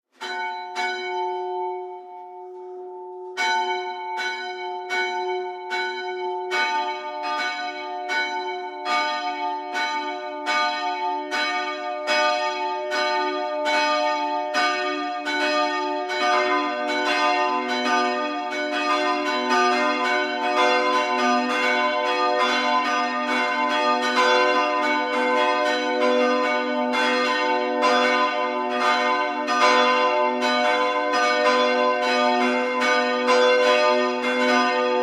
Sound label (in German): Glockengelaeut Kirche Ober-Breidenbach; Romröder Kirchen; Glockengeläute der Romröder Kirchen